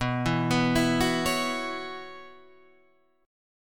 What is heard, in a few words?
F sharp 7th Suspended 4th, guitar